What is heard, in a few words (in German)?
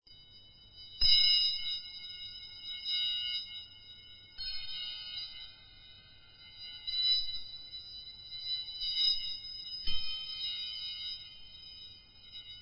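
klangstäbe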